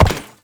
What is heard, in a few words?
Step Perforated Metal; Warframe; Uriel